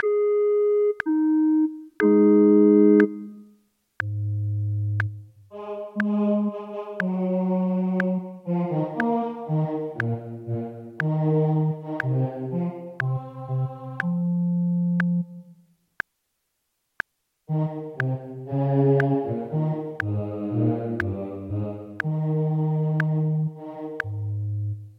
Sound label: O clap your hands; Gibbons